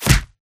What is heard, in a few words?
bullet light ballistic flesh; Titanfall 2